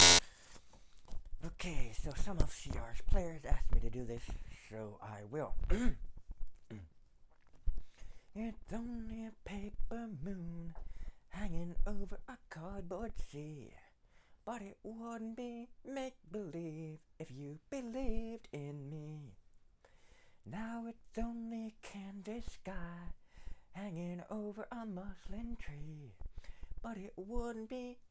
singing

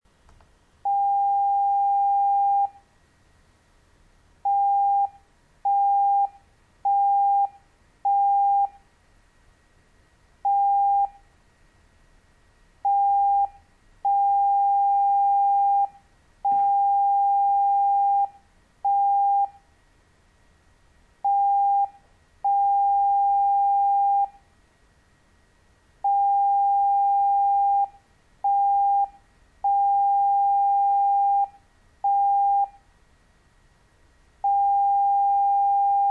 morse code; il 2

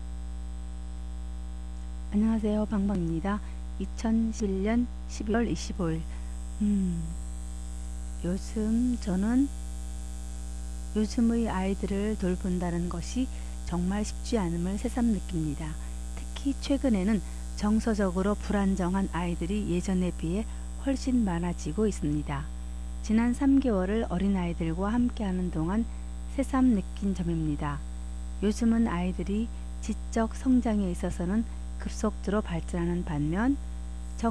korean try to loudly laugh and relax

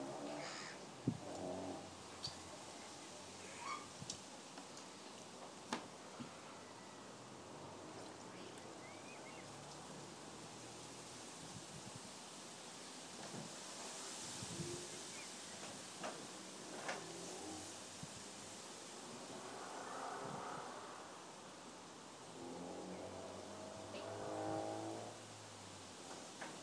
swaying reeds waterfowl speedway Rye Meads

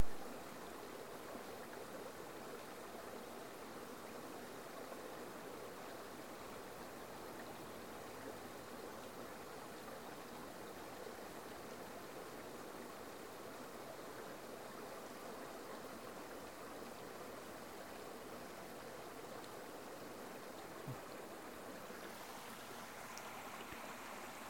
Peak District river

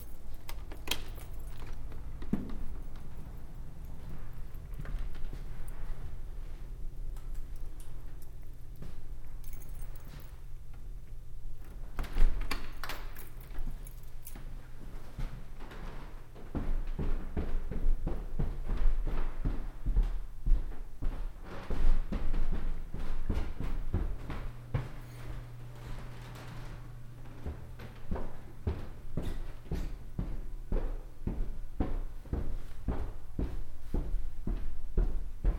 keys locking the door walk down the stairs

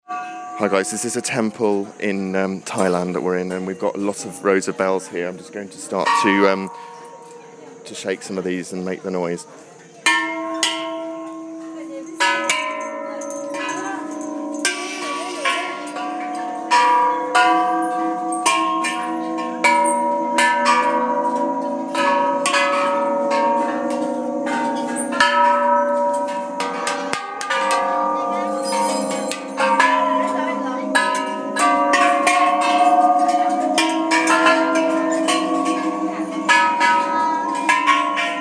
ringing bells in large temple

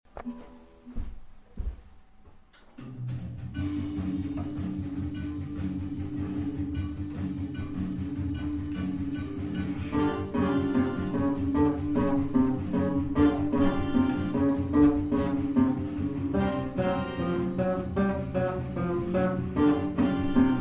bass riff

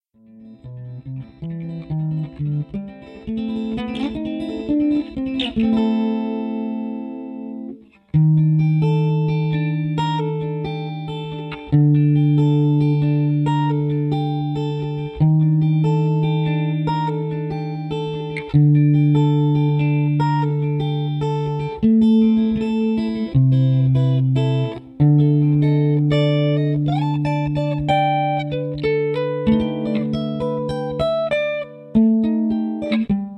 ballad of am7b5sus4

